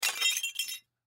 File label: Wind Chimes Set Down Musical; Multimedia; Foley